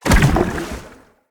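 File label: Staff Caster Projectile Destroy; Weapons; Staves; Dendrit Staff Caster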